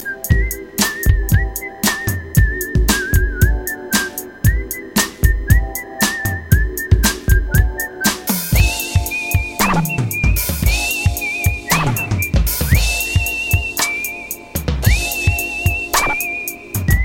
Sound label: Trap; Kill Bill